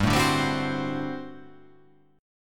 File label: G Major 7th Suspended 2nd Suspended 4th G down